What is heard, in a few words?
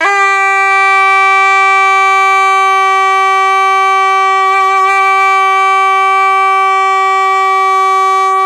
BI SUA G4, BISUA REED; Spectrasonics; Heart of Asia